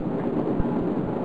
Diesel; U-Boat 1.5.3

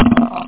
burp; The Epic Collection 3; Epic Marketing; muzak samples

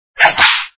Slap; Puzzle

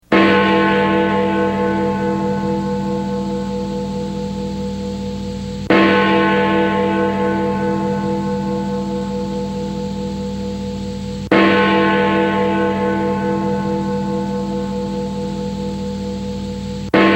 Y2K Gong

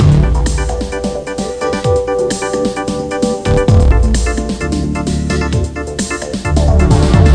4 bar; Singletrak Mind

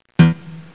Pluck; Electronic Whole Earth; MUSIC

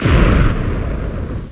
explo; MPG-Net (USA); imperium

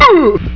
ugff; Gold Digger 1.2.1; Arcade

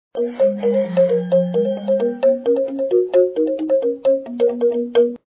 xylo; maya miguel global groovin

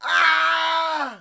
plyr ht; quake; halo; hurt

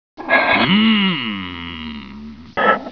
Manhole; T.J. Finds a Friend

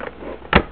Powerbook Close; PowerTalk Tour

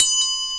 DING

